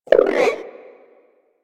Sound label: Wyrm Idle, Vocals; Warframe; Pets